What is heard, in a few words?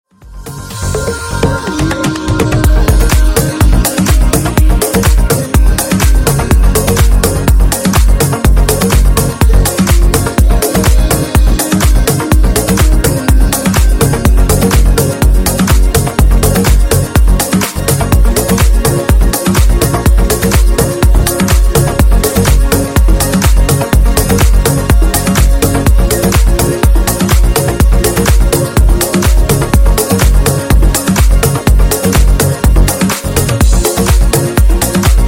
Funky Remix 124Bpm